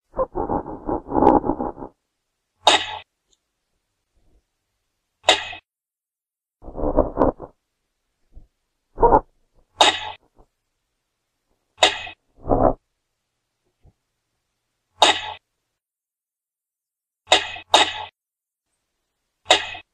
El sonido de la gineta; Sonidos cortos de animales

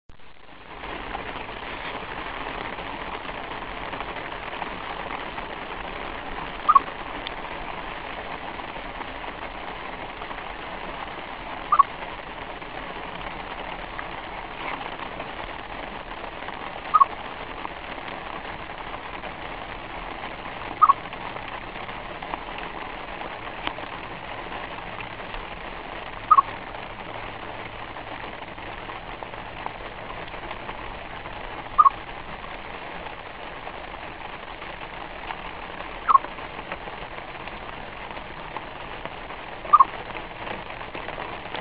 sonido ardilla

